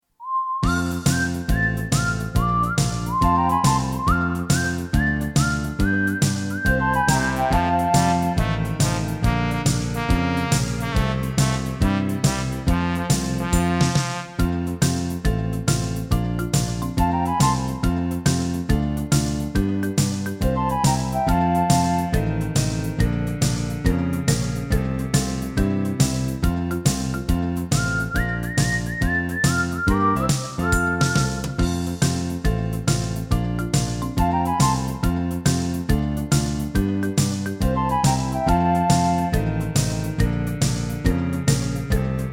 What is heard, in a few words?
karaoke only